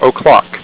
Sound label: time clock o'clock